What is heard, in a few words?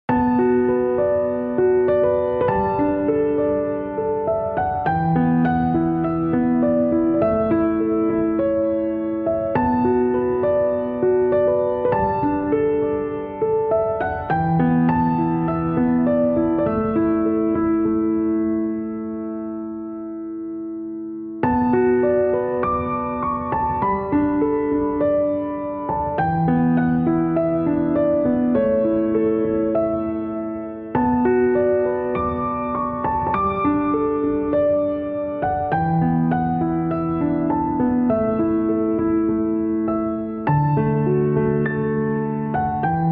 Piano